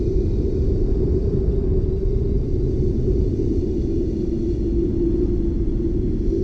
evil wind; quake2

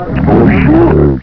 pain; quake2; ranger

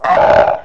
jump; quake2; ranger

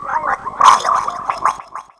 drown; quake2; austavin

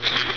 gurp; quake2; snork

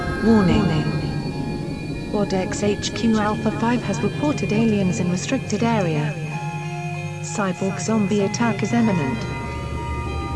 warning; quake2

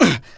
fall; quake2; drfaustus

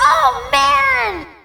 death; quake2; buttercup

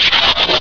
trans down; quake2; vibrate

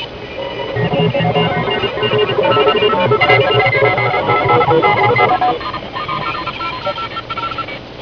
alien radio; quake2; kitsune

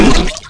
drown; quake2; martian

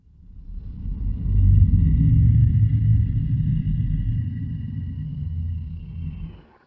alien, tomb; quake2; zaero

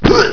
jump; quake2; dung

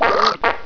gurp; quake2; fat

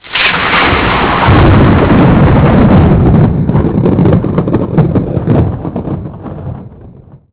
bolt; quake2; serious

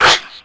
pain; quake2; fbetch

